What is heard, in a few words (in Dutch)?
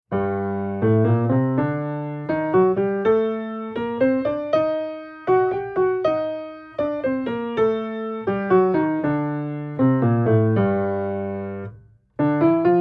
Gevarieerde ritmen, piano oefeningen